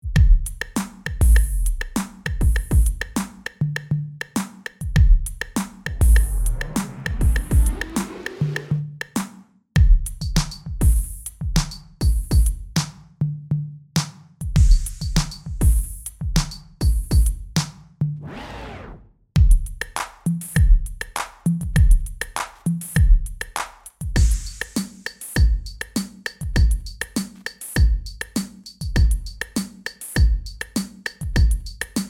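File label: vinyl drum machine 50hz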